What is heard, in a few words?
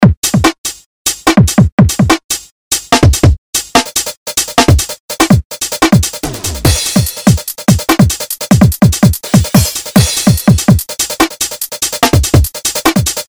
DnB